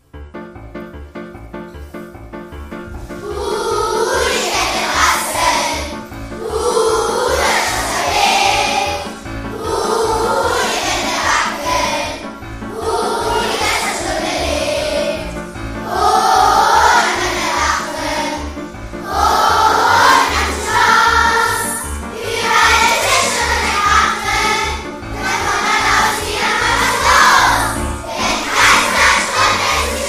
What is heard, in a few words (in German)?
Huhuhu, Chor der Grundschule; Geisterstunde2014